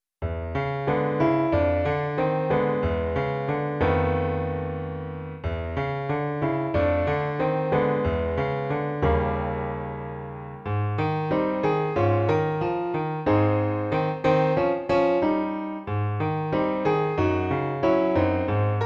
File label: Monkey dance, Piano seul; Jazz